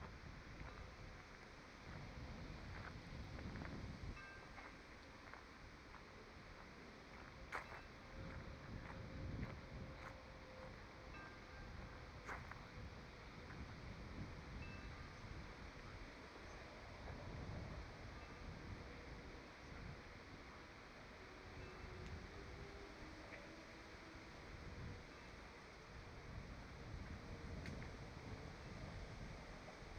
park, scenes; IEEE AASP

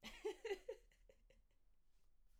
laughter; IEEE AASP

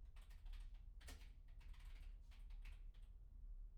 keyboard; IEEE AASP

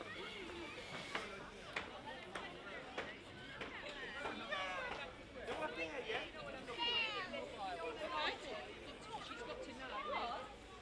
open air market, scenes; IEEE AASP

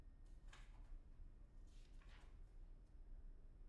pageturn; IEEE AASP

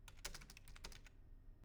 keyboard; IEEE AASP

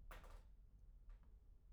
pendrop; IEEE AASP

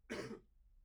clear throat; IEEE AASP

